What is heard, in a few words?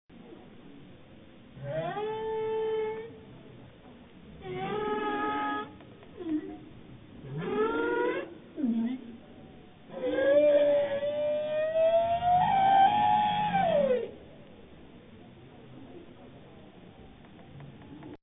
Loud bowhead; arctic; mammals